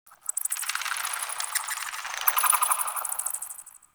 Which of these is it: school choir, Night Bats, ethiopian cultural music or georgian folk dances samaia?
Night Bats